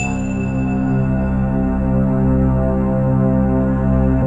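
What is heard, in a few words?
C 2, BELL STRINGS; Best Service; Advanced Media Trax Modern Composer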